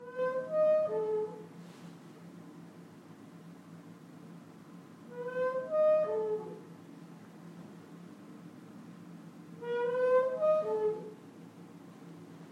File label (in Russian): skripyashaya dver v vannuyu komnatu